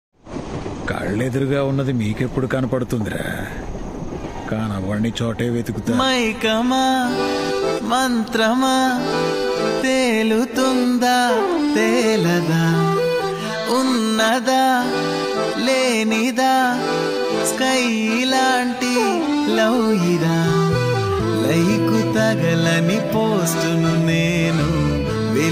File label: telugu; thiru movie